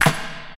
00s pop snare drum sound d sharp key, acoustic snare sample tuned to the D# note